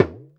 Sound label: AFRK MED; AKAI S-Series CD-ROM Sound Library VOL-2